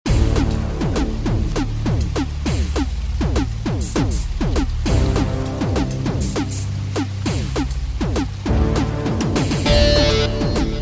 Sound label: beat